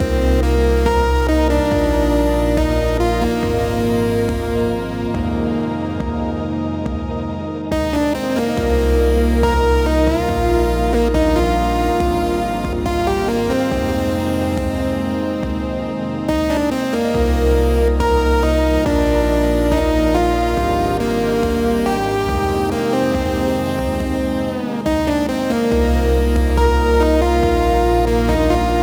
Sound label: Song Timestream